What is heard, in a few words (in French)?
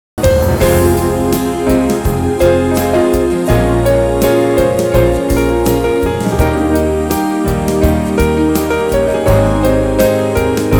piano